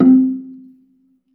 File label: BELA C3, BELAFON, Asian Traditions; USB Soundscan vol.60; AKAI